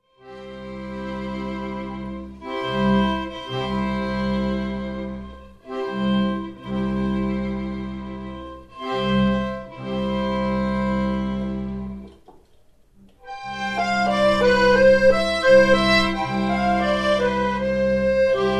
Hirtenweise

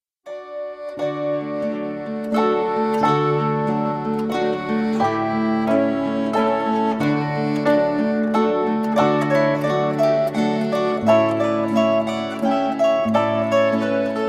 Schleifer, Stubenmusik; aus Langenbach

